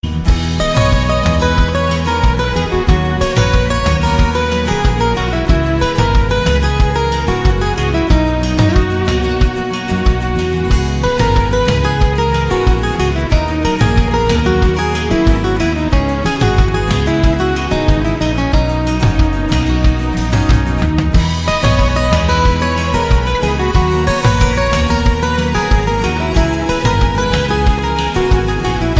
Turkish Music

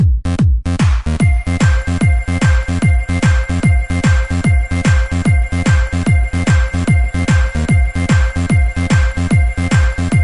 merry christmas mix

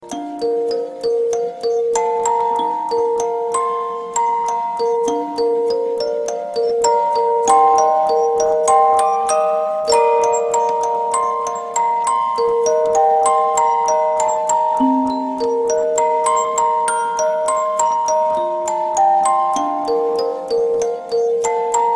Perfect Soft Tone

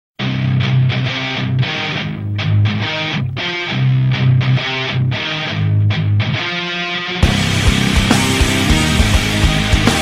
Awesome Rock Tune

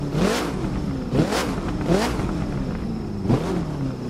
revving; Gen 5

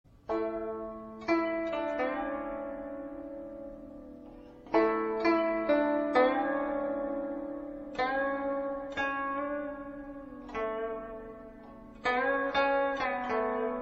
Guitar Tune

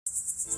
Beetle

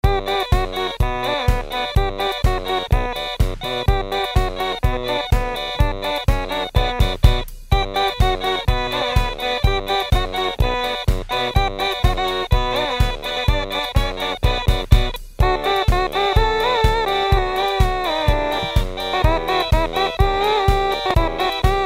Otamatone

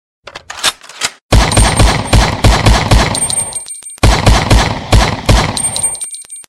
Gun; Matrix

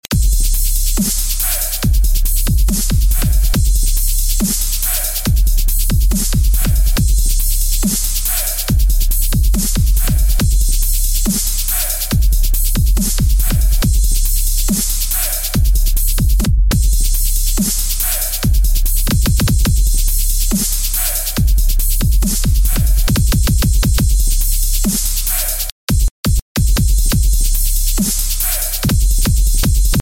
Dubstep SONNERIE

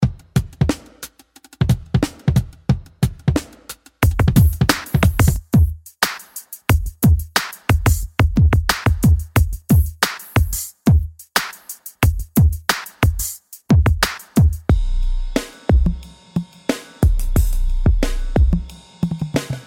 Cold Beats Solo

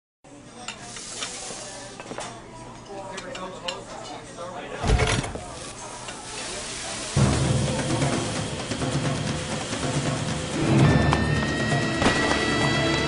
Ringtone; 7up

